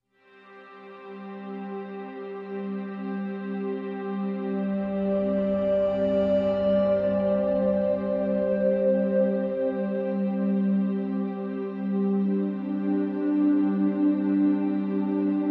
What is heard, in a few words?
No Drums No Vocal